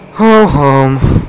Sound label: ho-hum; Sound Library 2000; Wayzata Technology; People